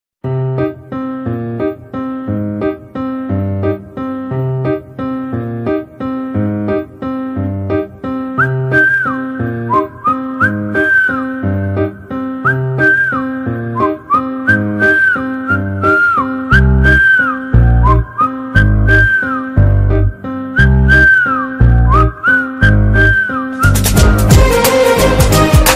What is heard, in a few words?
song bgm ringtone, love ringtone